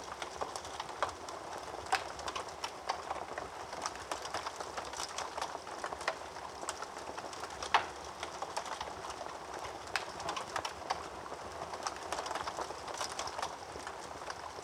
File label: Fire Crackle; Household